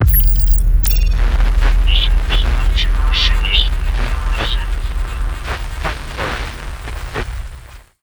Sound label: mysterious signal; Stellaris; nemesis